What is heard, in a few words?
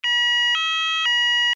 alarm tone, sounder alarm tone; sounder beacon